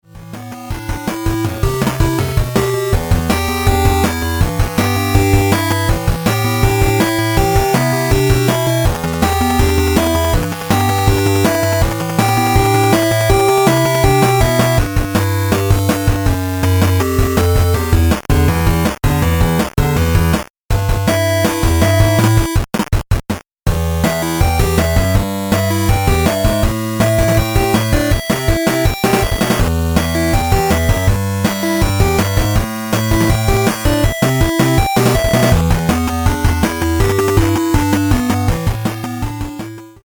Radio 8bit

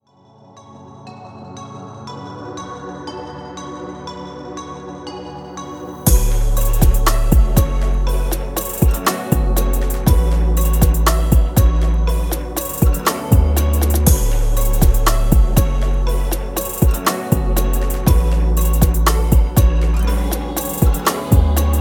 Instrumental

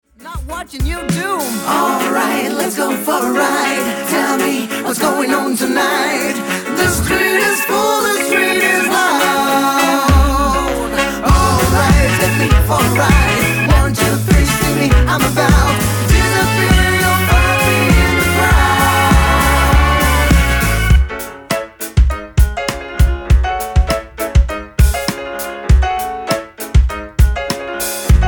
Sad Swing

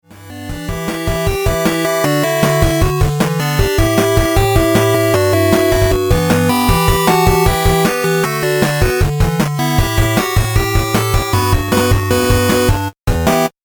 8bit

